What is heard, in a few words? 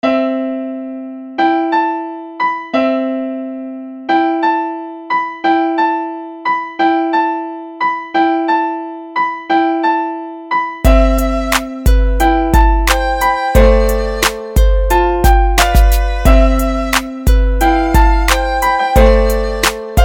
instrumental